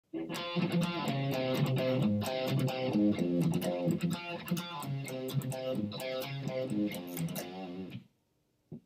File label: theme for guitar